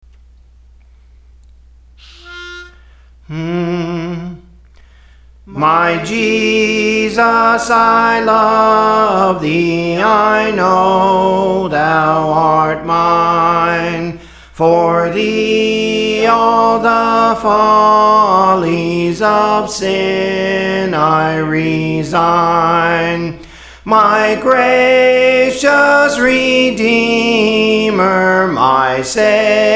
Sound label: Soprano, Songs; Praise For The Lord Training; My Jesus I Love Thee